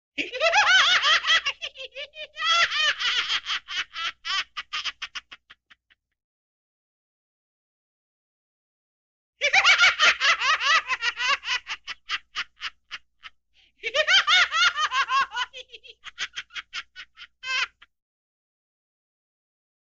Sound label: Crazy laughter